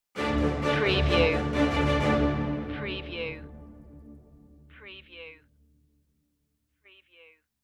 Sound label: epic medieval tale stinger